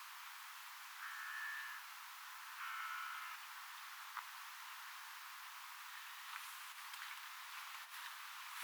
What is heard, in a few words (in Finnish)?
sirkkelivaris